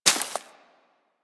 Bullet Impact Debris Grass; Warframe; Weapons

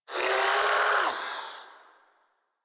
Shadow Vocal Pain; Warframe; Duviri

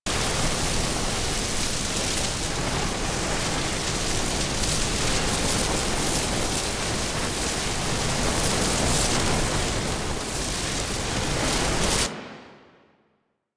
Alchemy Grenade Loop Fire; Warframe; Entrati

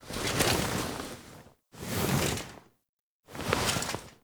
Sits Up Foley; Teshin; Warframe; The New War; Throne Room

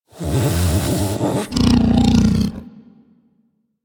Vocal Breathe Slow; Warframe; Hunting Critters; Undazoa

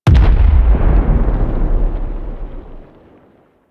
Teralyst Far Step; Enemies; Steps

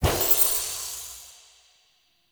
Note Bond; UI; Minigames; Pied Piper

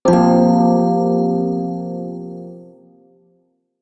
Prime Shawzin Hirajoshi Chord A; Music; Warframe

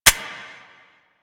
Quad Sniper Low Clip; Weapons; DE; Warframe; Tenno

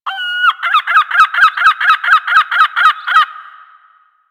Beach Seagull; Ambience; Warframe; Grineer Beach

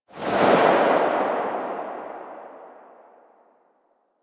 Burst Rifle Tail; Weapons; Grineer

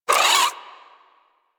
vocal attack, critters; Warframe; Hunting Critters; Nexifera

